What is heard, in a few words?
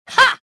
deflect; tavion